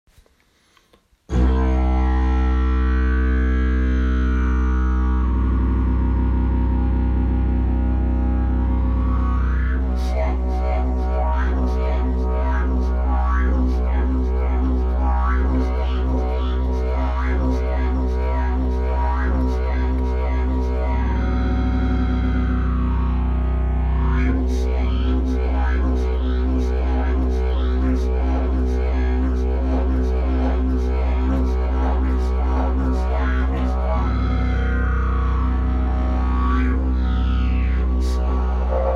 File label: didgeridoo; jilm mirotvor